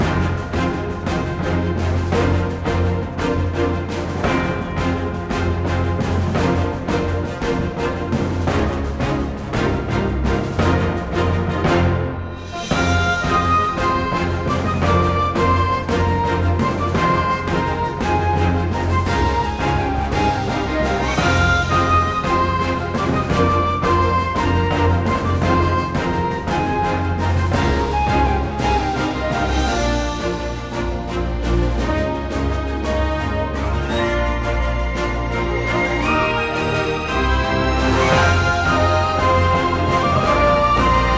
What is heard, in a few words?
Battle Theme Loop